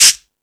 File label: Percussion, Drum Machines